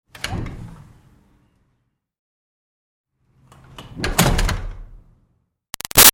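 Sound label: Door Metal Retail Push Interior Open-Close; Metal Doors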